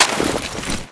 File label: bazooka pickup; svencoop; ww2projekt